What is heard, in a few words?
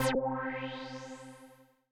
another intro synth; 'Go Fuck Yourself' samples; lolicore